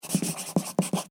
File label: Office Pencil Write On Cardboard; Writing; Human